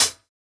Closed Hat, JUNGLE BREAKS

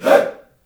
"HEY", Heart of Africa, shout

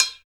RESOSTIK MF, PERC; TEK PERC2

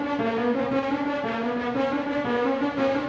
GRO, Orchestral Loops; 128 HITCHCOCK

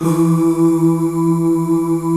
E2 MALE OO; Northstar - New Gold VOL-2

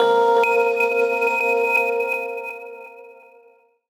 VIB WHIZ FX, Gbm; Rawcutz - Nu Forms

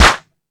House Machine, Drum Machines

